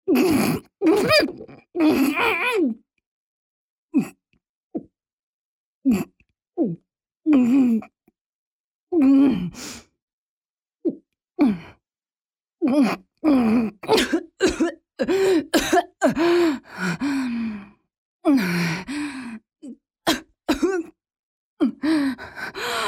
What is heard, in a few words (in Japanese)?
口内射精→飲精